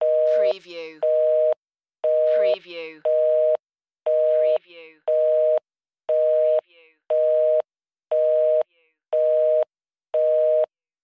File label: Telephone Busy Signal